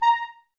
Clarinets, Wood Winds

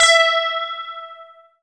GUI CLEAN, E-Guitar; E-GUITARS

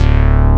Bass Synth D0; Proteus 2000; Digital Sound Factory Proteus 2000

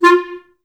STAC F3, Orchestra; Best Service; Peter Siedlaczek's Advanced Orchestra

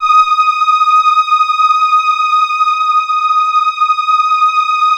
RESONANT D#6; Strictly Synthe3; E-MU Producer Series Vol. 6 – Analogia Project

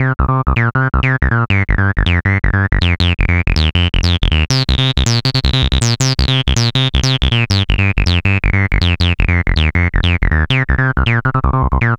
Hardcore Loops Acid Loops, Dance Synths, 160

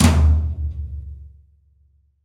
New Brush Flam Tom, Roland BK-5

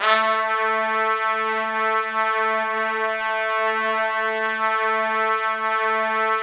Orchestral Brass; Best Service ProSamples vol.20; AKAI

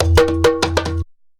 sabar groove; Best Service; World Colours

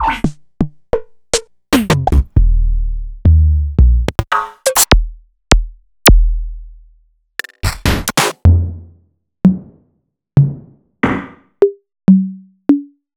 Percussions; Sliced

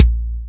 Boss DR-660 Kick, Drum Machines

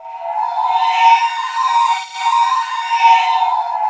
APPARITION, SPIRAL WINDS; Spectrasonic Distorted Reality 1

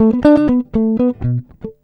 120 FUNKY, Acoustic Guitar Loops; Electric & Acoustic Guitar Loops; USB Soundscan vol.04; AKAI

